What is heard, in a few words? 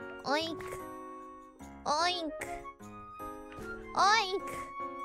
Oink